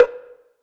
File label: Cow-bell, Afro Dancehall; Afro Drums; One Shots; Ghosthack; Drum Mega Bundle Demo